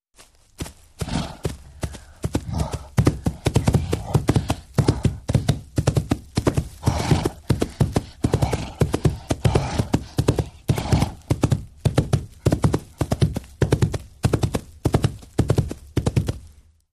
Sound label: Rhinoceros Chargi, animals wild rhinoceros